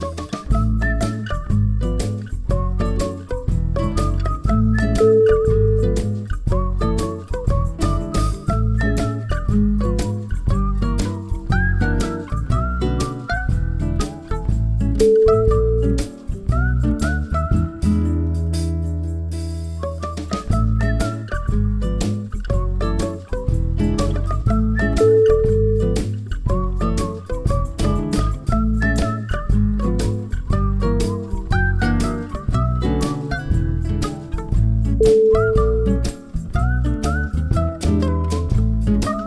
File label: commercial happy whistle